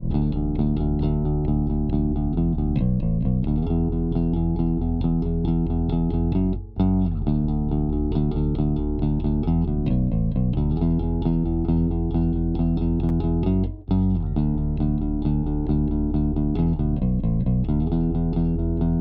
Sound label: bass mix